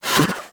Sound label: Pet Alert Snort; Enemies; Warframe; Catbrow; Pet Ship